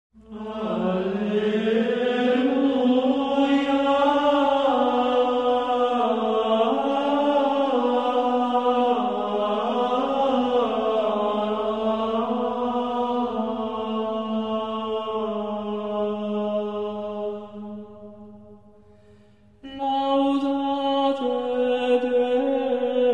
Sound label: Chant Laudate; Civ4 warlords; Medieval